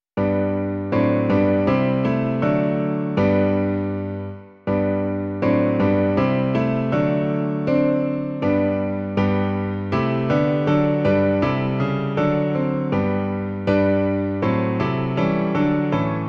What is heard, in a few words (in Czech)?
klavír; Klavírní verze